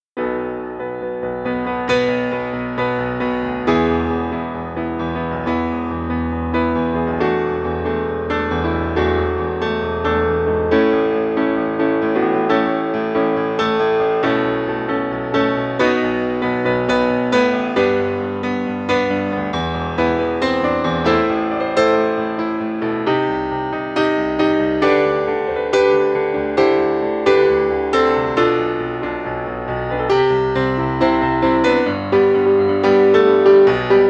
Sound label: FOLK